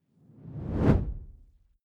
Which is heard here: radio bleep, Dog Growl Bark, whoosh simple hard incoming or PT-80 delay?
whoosh simple hard incoming